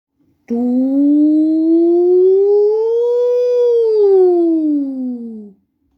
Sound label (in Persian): صدای سر